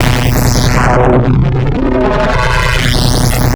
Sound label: SFX; Sonic Foundry (Sony Creative Software); Afterhours EDM; Session 10 - Come In Hard